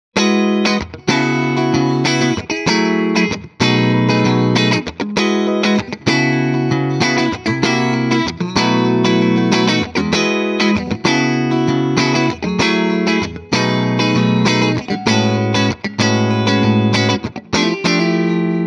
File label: Tele Clean Funky